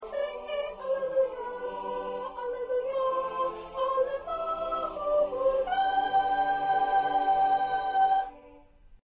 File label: 1st Soprano